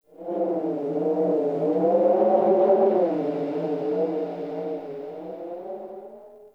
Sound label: Cave Wind Howl; Warframe; Venus Open World; Venus Landscape; Ambience